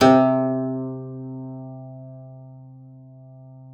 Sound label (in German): LAUTE C3; Xsample VOL-8; LAUTE+FLG